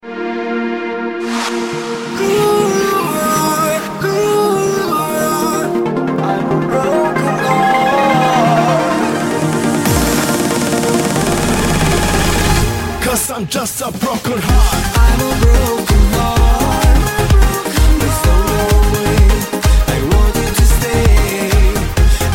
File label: sms broken hearted